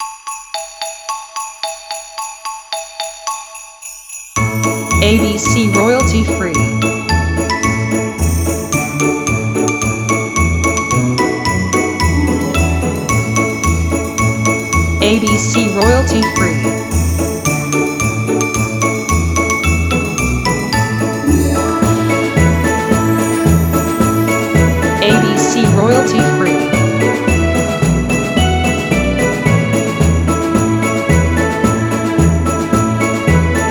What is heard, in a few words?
Jingle Bells